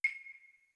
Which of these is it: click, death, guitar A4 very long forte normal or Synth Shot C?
click